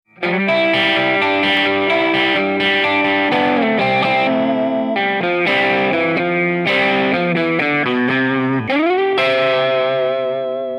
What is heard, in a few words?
Gretsch